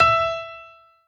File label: piano echo